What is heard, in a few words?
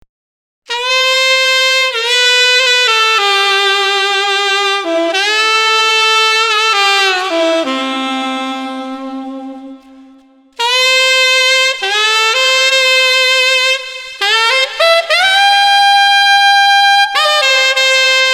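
Street Tenor Sax Devotion, 100 bpm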